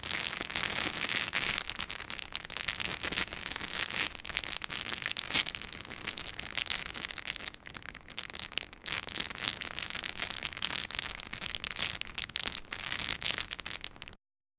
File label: candy wrapper crinkling